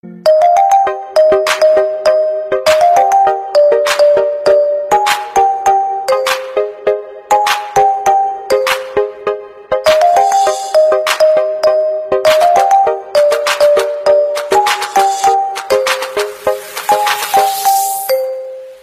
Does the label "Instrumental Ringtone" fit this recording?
Yes